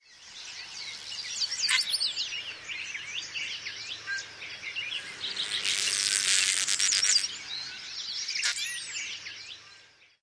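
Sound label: calls, Calliope Hummingbird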